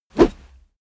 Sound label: whoosh heavy fast